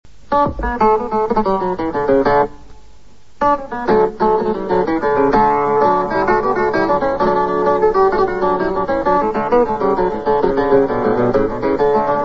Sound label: Swedish Jig